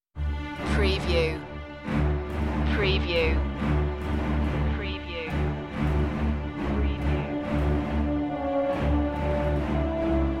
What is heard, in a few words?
no choir